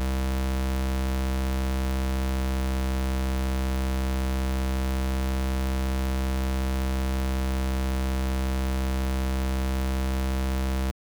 Bass

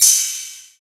Percs

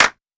clap one shot fat; Claps

